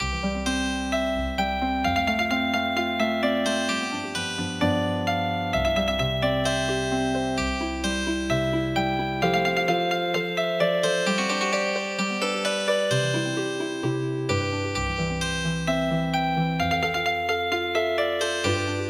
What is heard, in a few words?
Healing Harp; midi; traditional